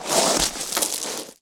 Bodyfall Gravel; SFX Kit; Bodyfalls

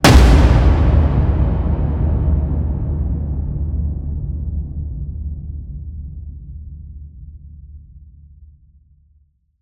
HIT Slam, Cinematic Hits Designed

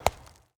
Baseball Bounce Dirt

